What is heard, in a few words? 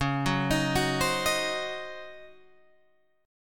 Db Major 7th Suspended 2nd Suspended 4th, Db, Guitar, Open G